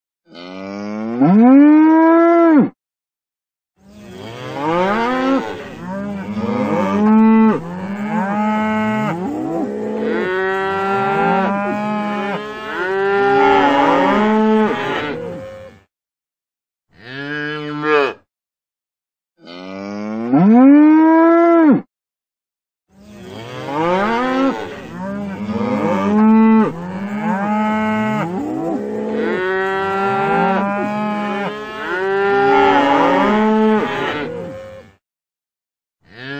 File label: Cattle Sounds moo low